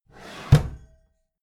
empty drawer smooth open heavy

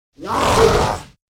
angry monster whoosh

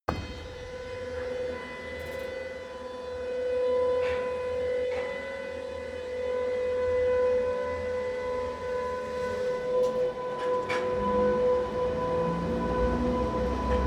train locomotive start rear buzz

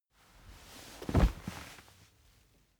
camping chair sit fast

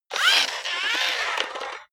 robot movement very slow

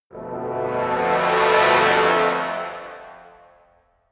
trombone crescendo short

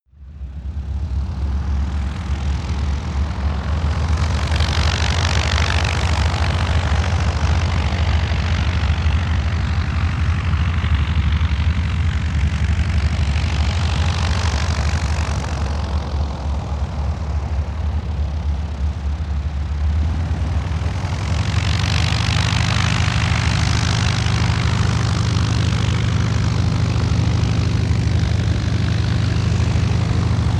propeller plane ju52 maneuver long

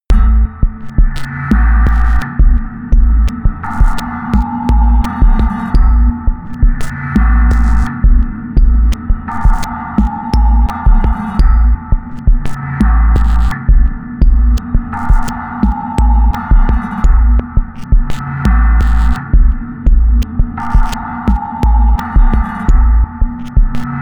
sequence electronic beat dark

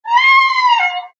little whale

